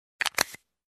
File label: Camera Shutter Sound